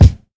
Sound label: studio steel kick drum c sharp key